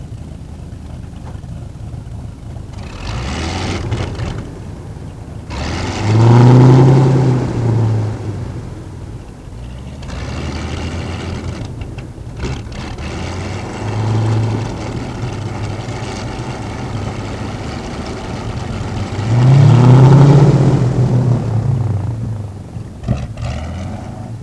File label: start up, p38